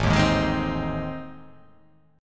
A Minor Major 7th Flat 5th A

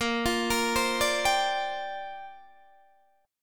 A sharp 6th Add 9th, Guitar DADGAD

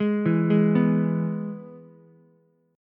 Ab Minor Sharp 5th, Ukulele; Abm#5 arpeggiated; JGuitar

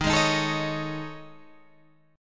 A Major 7th Flat 5th

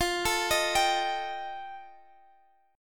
F 9th F, chord; arpeggiated

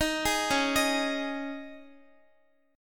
C sharp Suspended 2nd C sharp Guitar, chord; C#sus2; arpeggiated; x,x,13,13,13,10

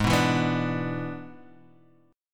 E 7th G#, guitar

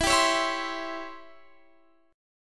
C sharp Minor Major 7th Flat 5th, chord

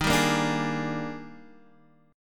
D sharp Major 11th, down, Guitar, Half Step Down

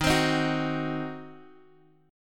Eb Minor 6th, down, Guitar, Open D

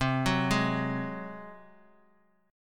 F sharp Suspended 2nd Flat 5th C, Chord

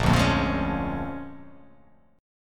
A sharp 7th Sharp 9th A sharp down

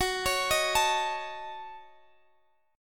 F sharp Minor 6th, Guitar Standard; arpeggiated; capo 2; x,x,16,18,16,17

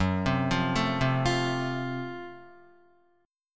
Gb Minor Major 7th Flat 5th Gb Guitar; arpeggiated; JGuitar

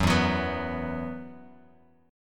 E Suspended 2nd E, down, Bass Standard

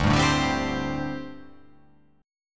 D 7th Suspended 4th, down

